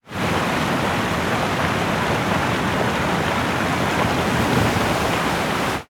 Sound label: damage hits explosions; World Of Warships